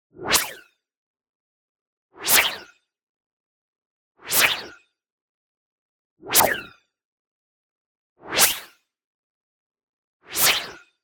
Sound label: WHOOSH White Noise Two Filters Freq Shift Short; boom library; Anime Essentials